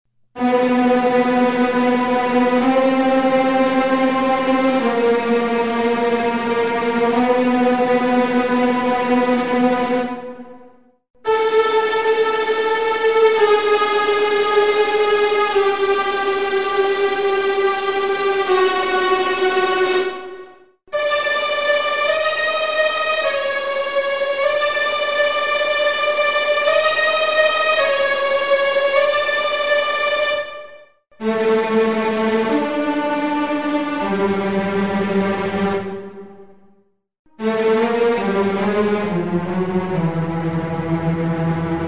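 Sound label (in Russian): zvuk priblijeniya opasnosti, sound of approaching danger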